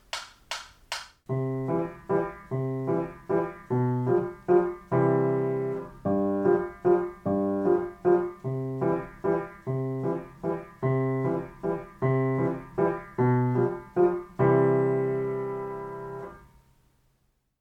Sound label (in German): Kuckuck Kuckuck Lehrerbegleitung PLAYALONG